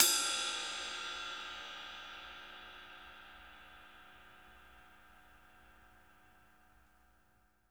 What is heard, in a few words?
RIDE, Drumworks; Sampleheads; New York City Drumworks VOL-1